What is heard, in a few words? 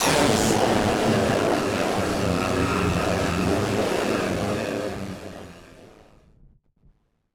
Malfunction Synth, malfunctioning synth samples